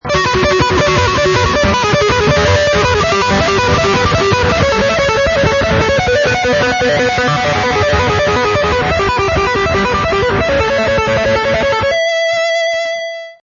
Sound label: two handed tapping